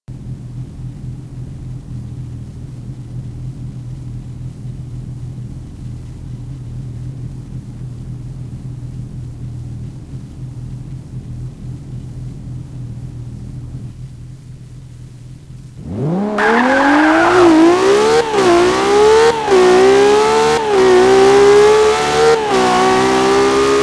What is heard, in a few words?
laferrari; vehicles